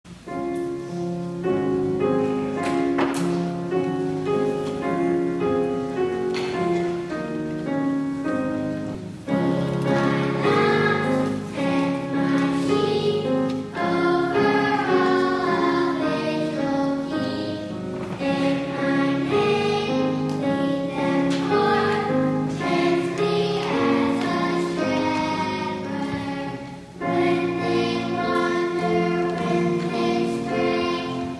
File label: Cherub Choir